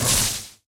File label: shield hit; Barotraumatic